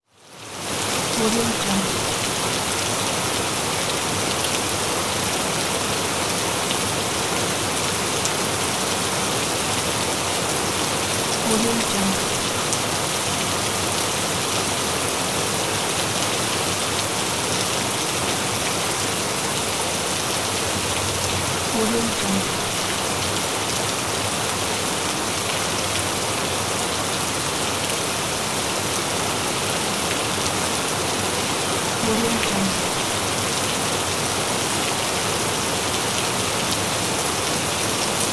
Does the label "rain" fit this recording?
Yes